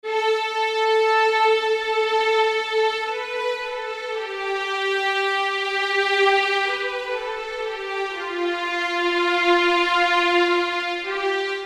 violins

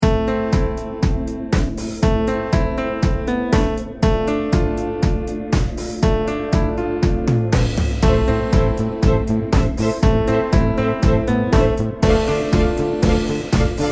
mix slam

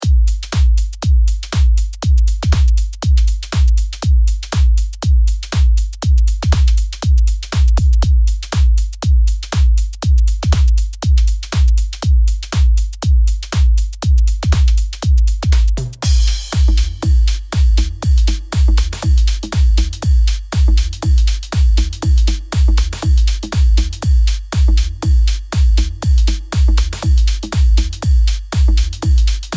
E drums

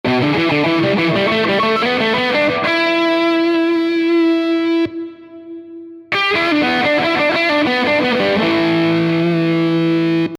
solo E650

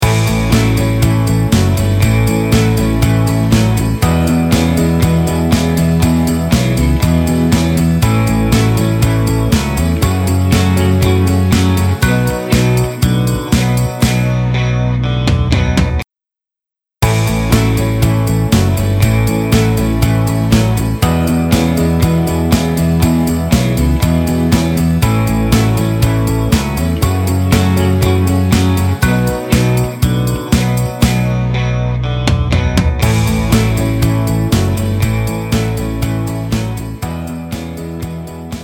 Americana Tight, Ele Pop Tight

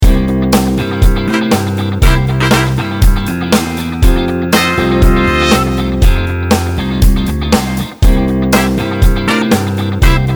Horns

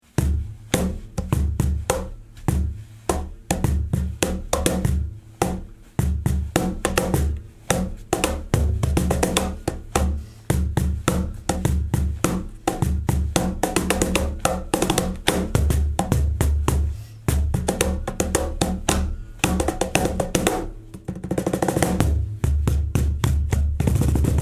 Cajon Groove

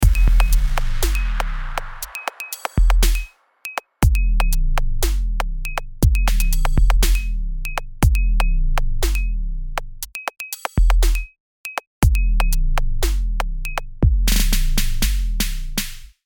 digital fusion

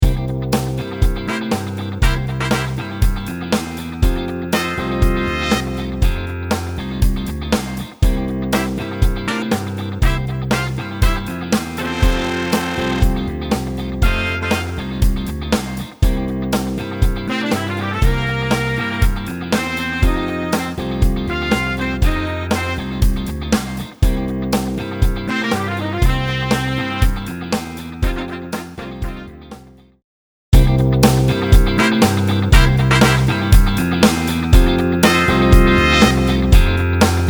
Session Horns

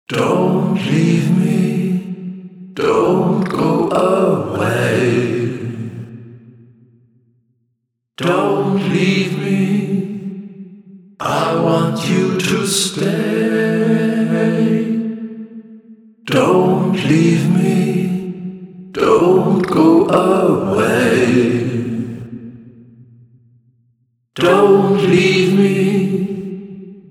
Unisono

